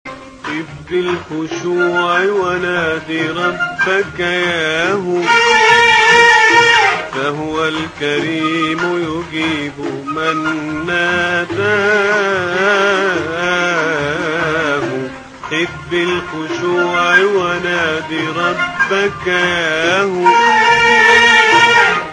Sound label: arabic ringtone, islamic ringtones